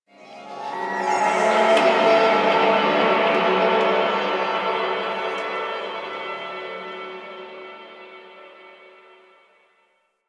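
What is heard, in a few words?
Amphitheatre Echoes; Ambience; Warframe; The New War; Zariman; Quests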